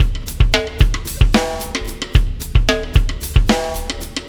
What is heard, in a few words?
Wormhole Beat, Processed Drumkits